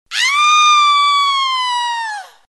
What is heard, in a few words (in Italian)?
urlo femminile